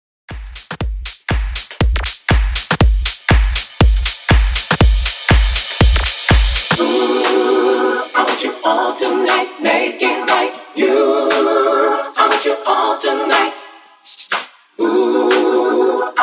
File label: 80s Funk Redrum 120Bpmm